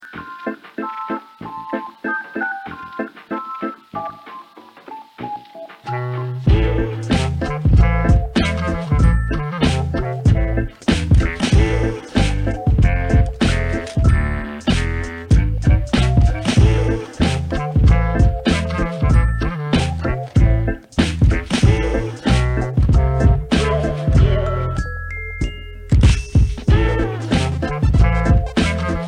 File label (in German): musik; battleblock theater